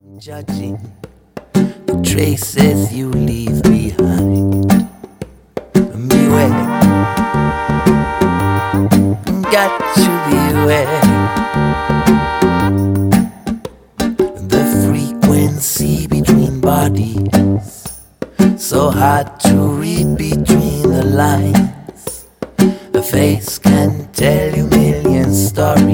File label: (Acoustic)